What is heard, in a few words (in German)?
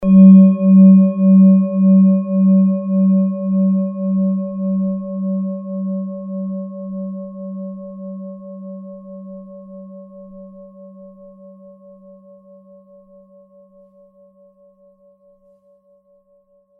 Universalschale